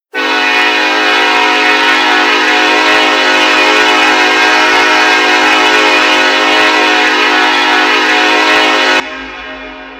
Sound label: k5lla, horn; run 8 v3 horn pack